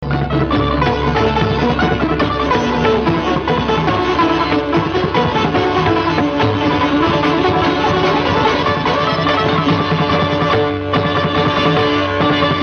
Dance Music; Amrapali; Hindi

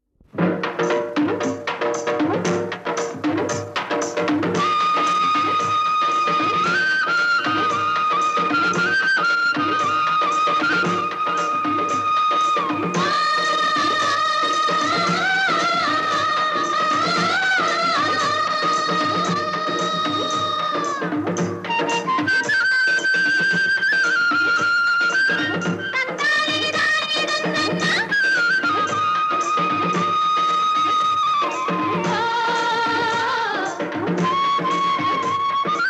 Instrumental; Pattina Pravesam; Tamil

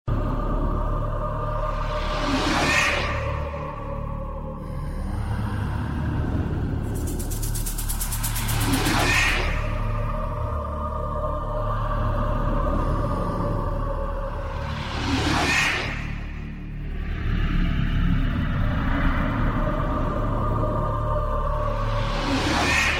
Ringtone; Tamil; 2009 Tamil Hit Ringtones

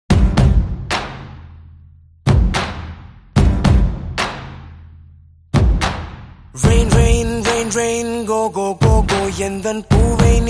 Rain Rain Go Go Karaoke; Tamil

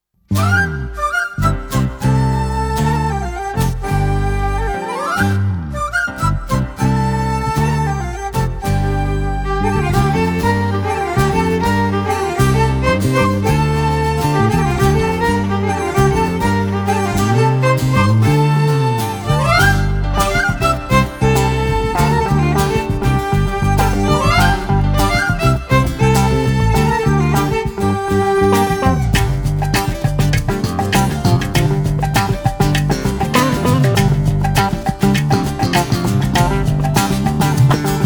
Instrumental; Tamil; Sivakarthikeyan; Maan Karate (2014)

